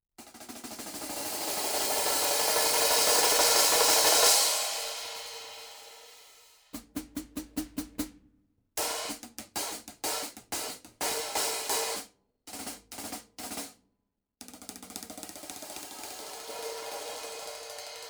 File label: 14 Agop 30th; Istanbul